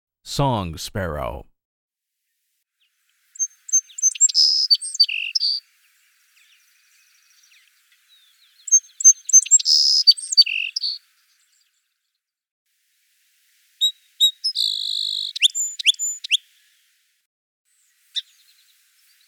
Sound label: Song Sparrow, bird calls; Non-Game Birds